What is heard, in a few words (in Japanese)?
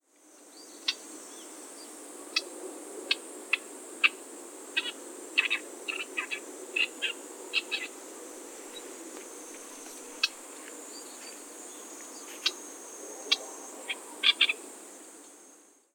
オオヨシキリ 地鳴き